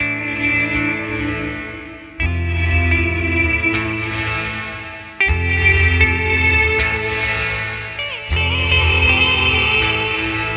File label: EQD Afterneath Reverberator